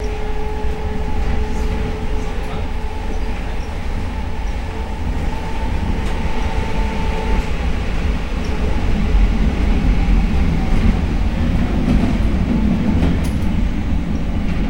Elect Bus In Stop, electric bus

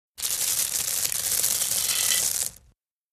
Rock Grav Pour Sparse

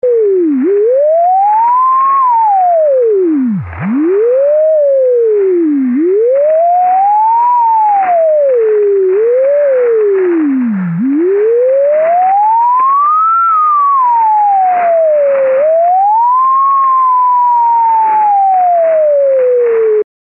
Tuning Old Style Sci, static

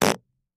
Close Up Fart

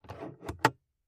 glove box open

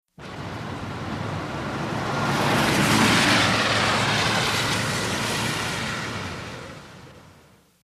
Individual cars pass on wet road.; TRAFFIC WET TRAFFIC: EXT B/G

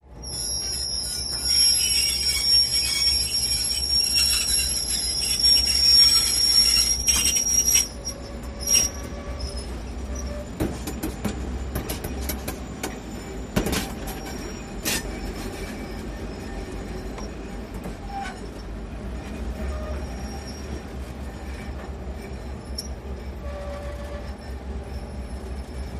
Train Wheels Squeak, diesel

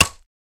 Staff Wood Hit High

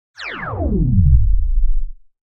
Laser Elect Shutdown

